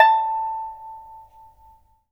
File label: PIPA A6; Spectrasonics; Heart of Asia